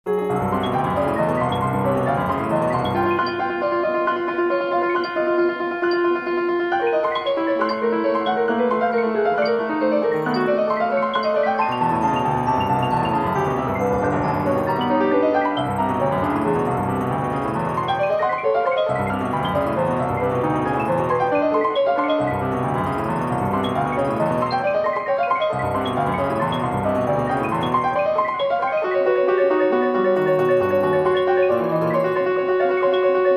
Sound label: Dig Piano